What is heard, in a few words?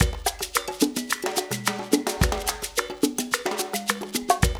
loop, Percussion Slam; InVision Interactive